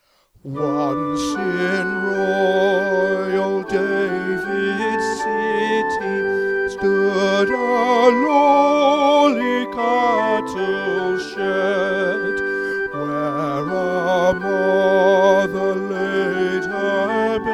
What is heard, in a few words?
Once In Royal Davids City Soprano